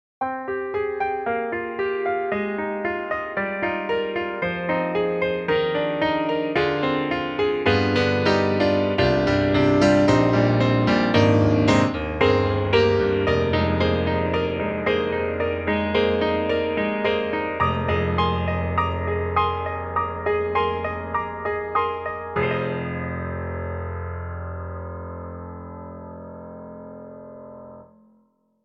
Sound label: Imperial Grand, Env Filter Grand; IK Multimedia; SampleTank3